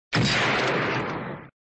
Sound Gun sound

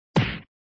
Sound Slash, flipper; Slash v4

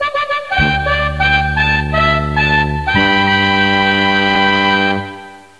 Music Fanfare

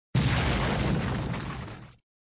Sound Explosion; Explosion (1 Droptarget) v2; flipper

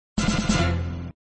Sound Tone, flipper; Tone v5

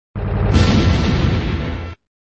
Sound SFX, flipper; sttng

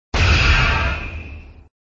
Sound SFX, flipper; sttng

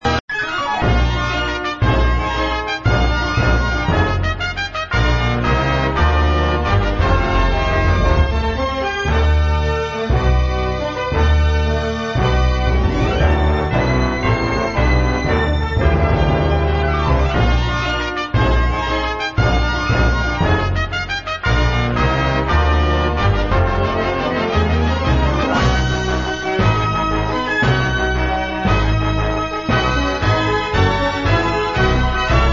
Music, flipper; sttng

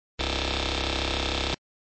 Sound Plane shooting (too fast), flipper

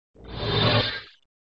Sound SFX, flipper; sttng

